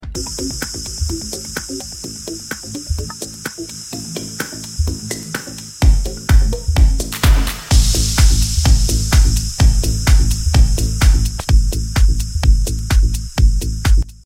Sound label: reverb